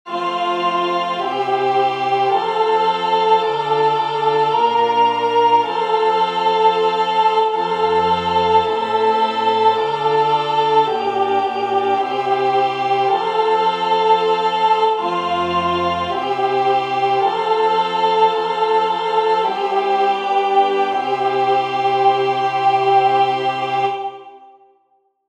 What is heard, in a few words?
Kyrie soprano; singing divine service iii in parts